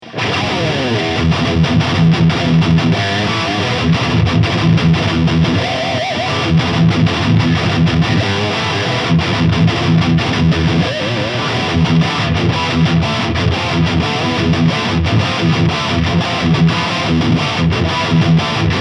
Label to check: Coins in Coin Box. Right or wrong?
Wrong